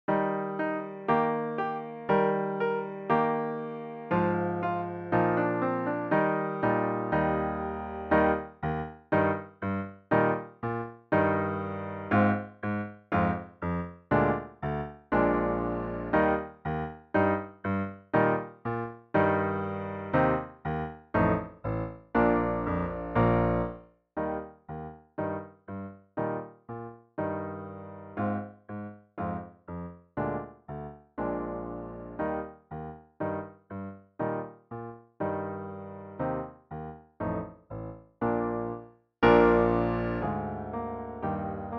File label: Tuba, Solos; Grade 9 Solos